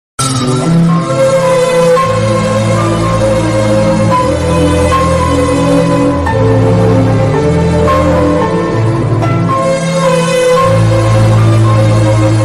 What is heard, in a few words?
telugu love bgm tune mobile ringtone